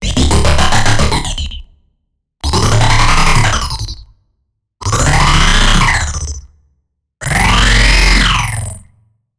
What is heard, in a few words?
SFX - Transformer; Routed Sound; Vital Expansion - Quantum Enforcer Vol.1